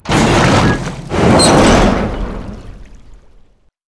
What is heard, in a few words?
dog lift gate; hl2 ep2; ambient, levels, outland